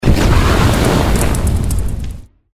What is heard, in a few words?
windup start; tiki-beats; eyecon